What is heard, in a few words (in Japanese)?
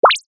ぴょこ